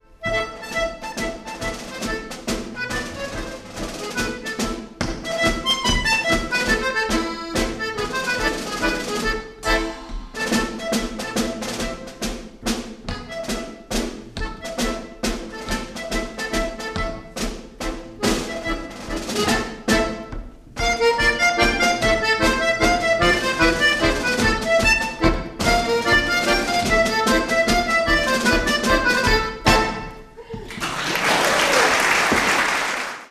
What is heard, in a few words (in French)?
cirque mazurque